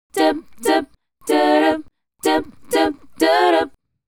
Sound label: Female Group, Hip-Hop and RnB Vocals